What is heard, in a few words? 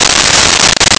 splash mix; Shots; Nintendo; wr64